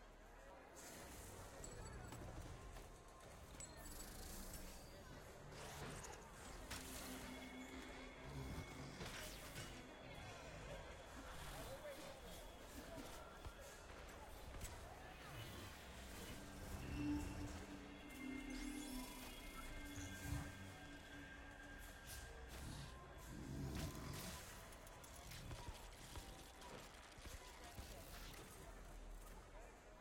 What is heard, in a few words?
amb market